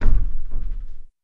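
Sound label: Shower Door Glass, Shower Door, Glass; Slide Fast With Roller Bearings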